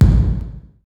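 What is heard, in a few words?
bass drum; E-Lab; X Static Goldmine 1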